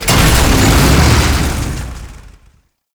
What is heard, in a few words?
Tank Movement Start; Enemies; Lasrian Tank; Warframe